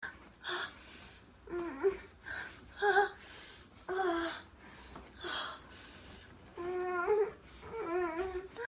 sex, moans; The Witcher Hunt

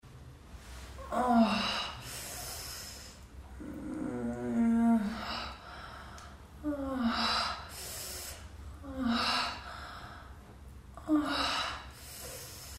sex; Low Blow

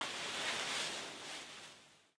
rustle; Treasure of Nadia

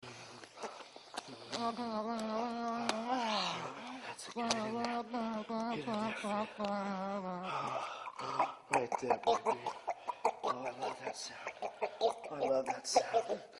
throat, moans; The Witcher Hunt

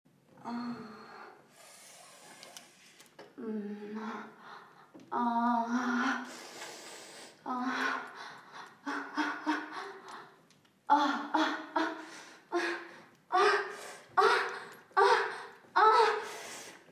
sex, moans; The Witcher Hunt

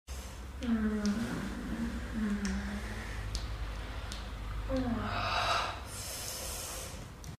jerk, moans; The Witcher Hunt

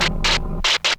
Neon; Treasure of Nadia

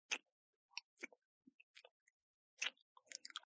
slurp Full; Angry Girl

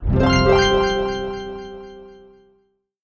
Harp; Treasure of Nadia

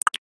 Click; Treasure of Nadia

